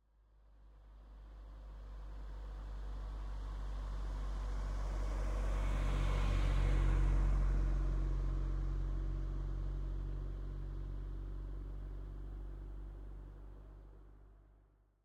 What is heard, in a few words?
Mazda Miata MX5 NBFL 1.6 Modded - EXT - passby constant 20kmph ground; stereo; Soundholder